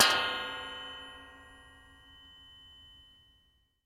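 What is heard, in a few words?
Metal Plate Small Hit, metal impact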